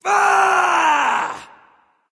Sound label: battlecry; smite; voicepack; xbalanque